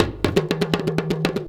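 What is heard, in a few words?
big tama groove; Best Service; World Colours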